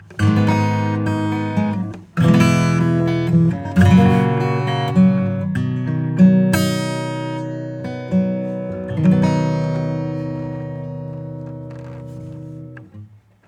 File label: Nanopulse RESONANCE